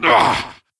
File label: Wound Medium; Creature; Varimathras